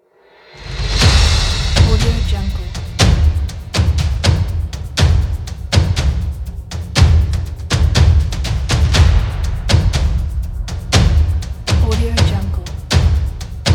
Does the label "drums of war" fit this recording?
Yes